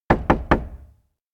door vet knock; anemoi

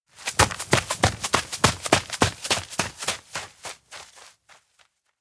steps outdoor grassland run; chiharu; anemoi

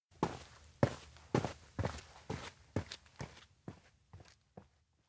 steps outdoor road leave flee; anemoi

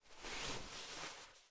cloth stroke; anemoi

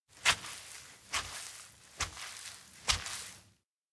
feet mud step; rikka; anemoi

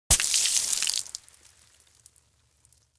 can spewing; anemoi

